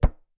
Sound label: sling metal, attack impact